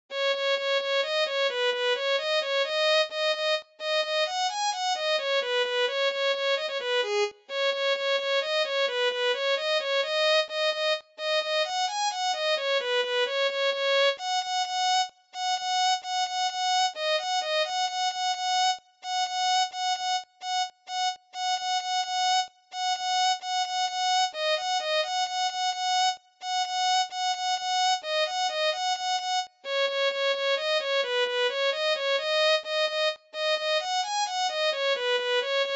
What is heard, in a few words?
Violin